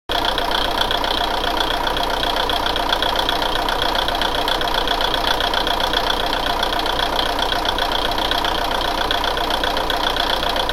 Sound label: idling, rail; minato; kiha222